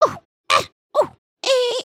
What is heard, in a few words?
soccer; emotes